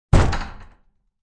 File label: Break Down Door, Banging and cracking of a door being broken down; Breaking; Objects